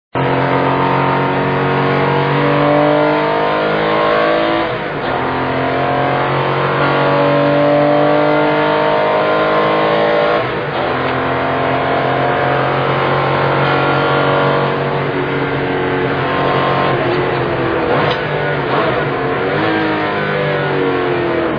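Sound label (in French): 964, Porsche, moteurs; (1992); A bord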